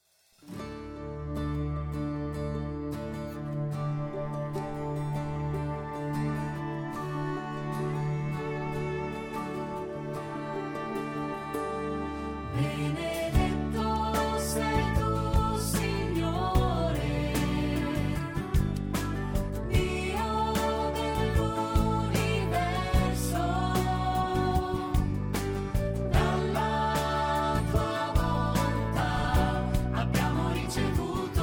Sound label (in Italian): davanti a te o dio piano el